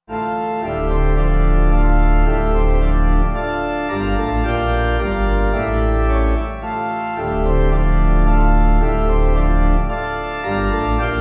Pipe

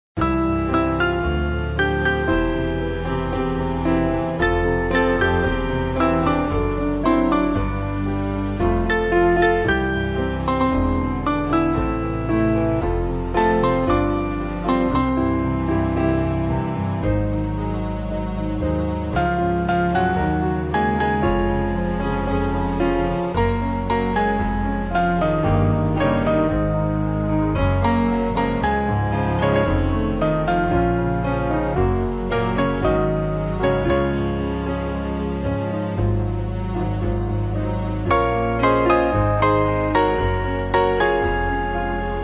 Piano

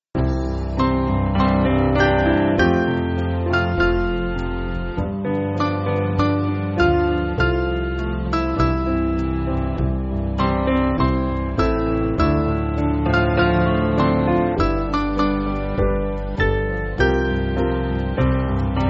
Piano

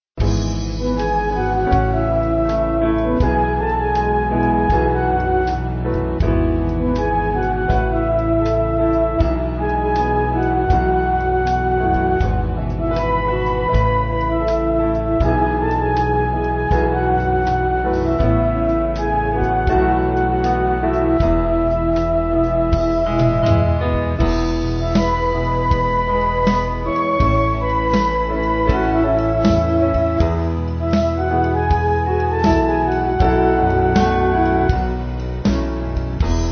Inst

